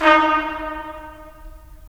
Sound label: BRS, Tps Stacc; Roland - LA Composer vol. 4